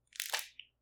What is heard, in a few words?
pill remove